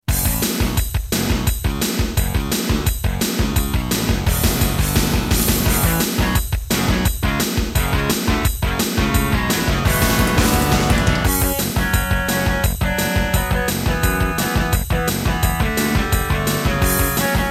Intro Terratec MiniWaveSystem TTWT; Duke Nukem 3D

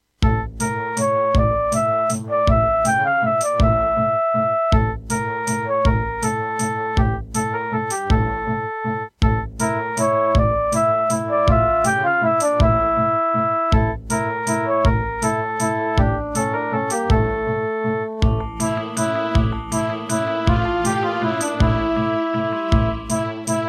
Serdaco Qwave

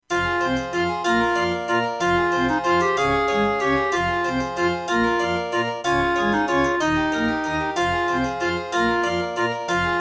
Track 6, Roland SCB-55; Death Gate

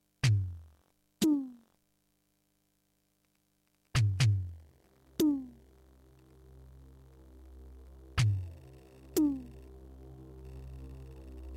Terratec MiniWaveSystem TTWT; Doom - E1M8

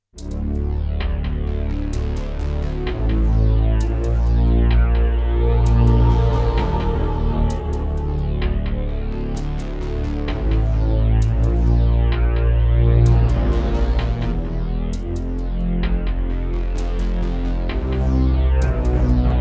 Boss Dr. Synth DS-330; Descent II - Briefing

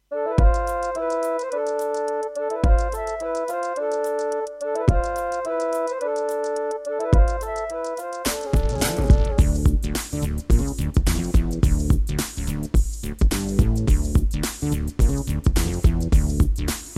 Yamaha MU2000EX XGMODE

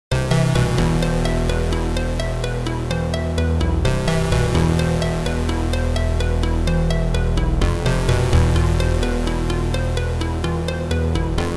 Serdaco MP32L MT32ROM

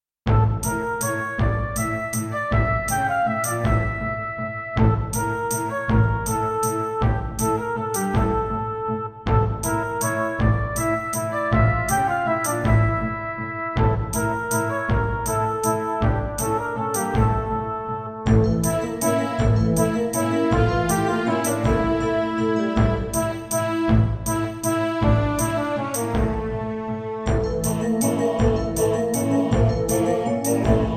Roland SC-88ST Pro 55MAP

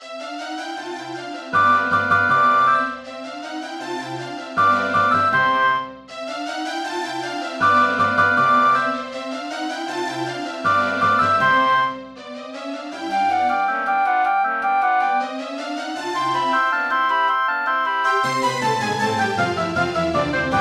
Yamaha MU5; classical; Mozart; Concerto K467 partial

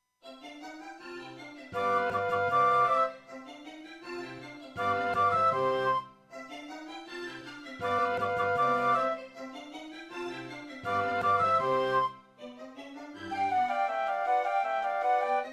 Concerto partial, Serdaco X3MB Buran; classical; Mozart; Concerto K467 partial

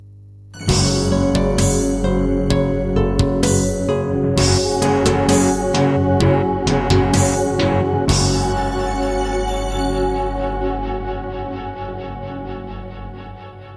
Creative Wave Blaster II; Doom (Sigil); Introduction

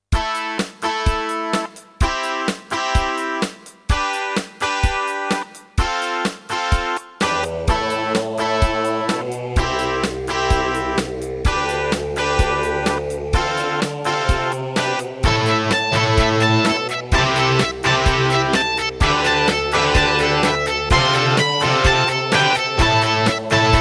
Roland SC-88; raptor call of the shadows

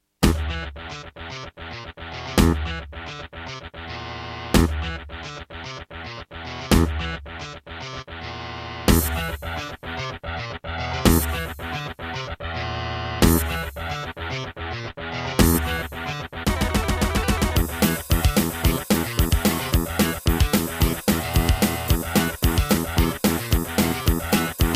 Terratec MiniWaveSystem SOWT-11; Doom - E1M1

